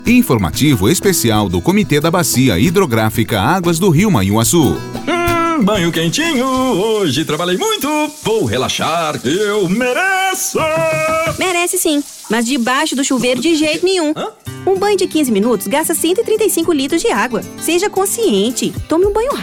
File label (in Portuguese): Cantando no chuveiro